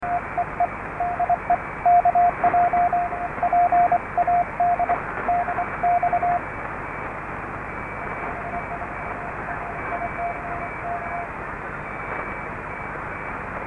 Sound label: PAD; DC RX Polyakov